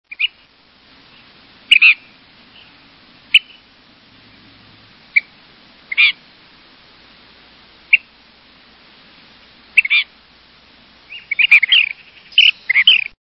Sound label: Ash throated Flycatcher